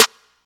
noisy acoustic snare sound a key, acoustic snare tuned to the A note